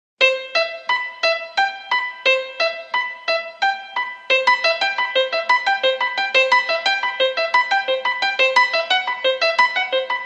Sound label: duet